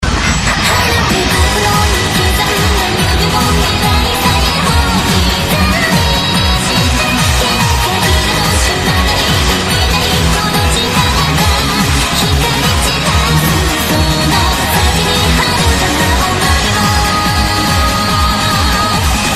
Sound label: 8bit